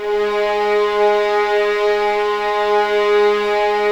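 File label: G#3 legato mf violins L; East West; The Ultimate String Collection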